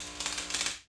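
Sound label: ambience interior arc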